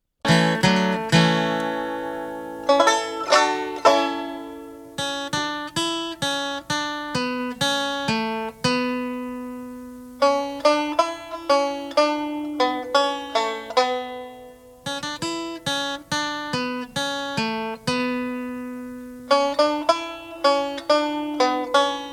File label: imitation